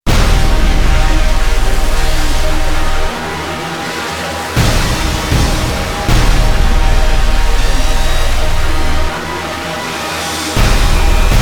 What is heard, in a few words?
Synth Remix